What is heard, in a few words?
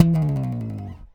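Yamaha slide, Bass; Masterbits CLIMAX 7; PATITUCCI BS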